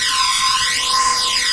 Imp Fly; Marisa Kirisame

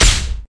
imp fire; deepimpact

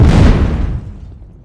expl; Marisa Kirisame

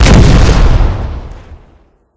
shell; eviscerator

